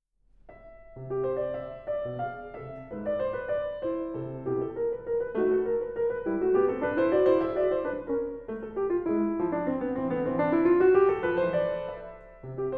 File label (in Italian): Sette Valzer N. 1 Pianoforte; Sette Valzer op. FZ245